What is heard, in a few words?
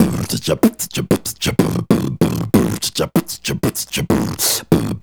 Beat Box, Hip-Hop and RnB Vocals